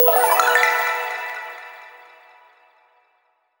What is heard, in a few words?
belltree; wii